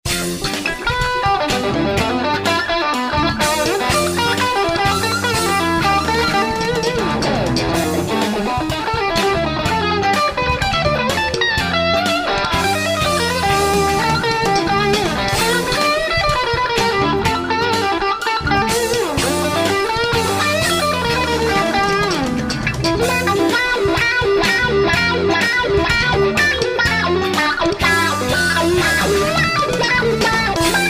improviso funk

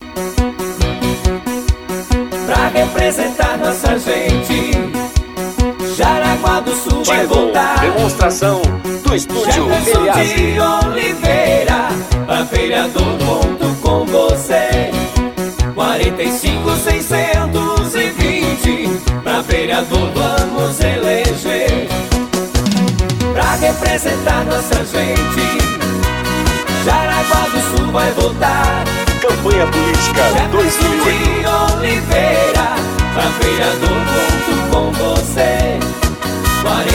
jingle vereador

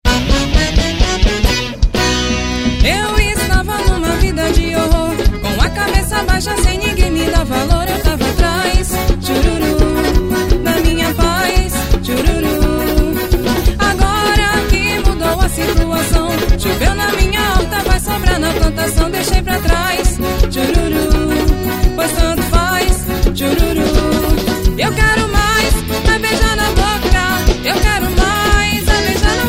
xote ao vivo

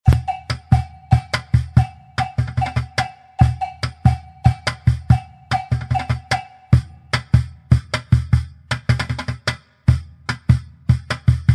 funk tupiniquim

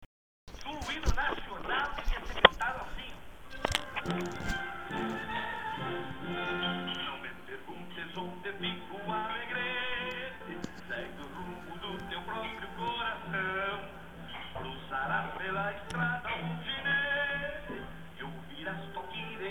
hino gaucho

